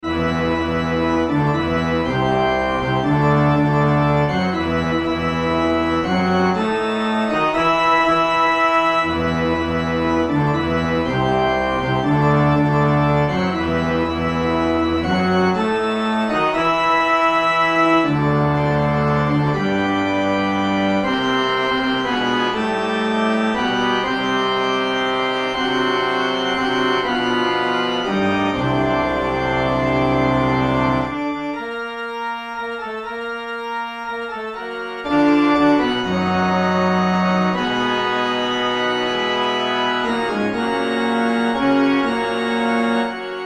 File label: Bass